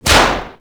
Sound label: Snap - Short; deimos rising